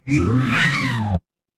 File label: mechanical servo